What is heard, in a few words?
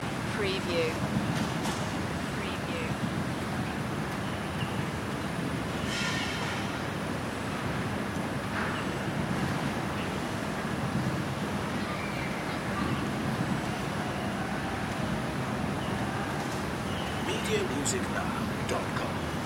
calm backyard ambience; loop